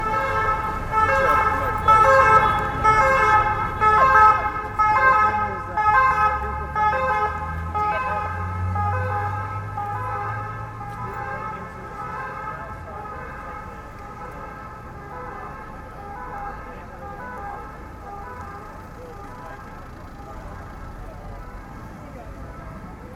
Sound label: Ambulance